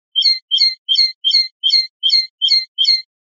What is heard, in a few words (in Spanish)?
pitadas, pardillo